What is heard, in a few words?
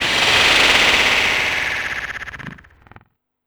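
sound effects, 160 BPM, Drum & Bass; Ueberschall; Drum & Bass Flash Trackz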